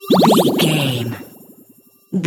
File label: potion bubble effect brew